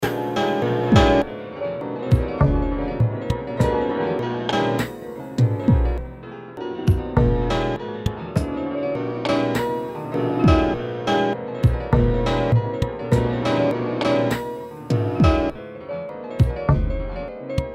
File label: piano seed; archivorithm